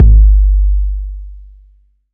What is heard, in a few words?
round bass drum one shot G key; kick drum samples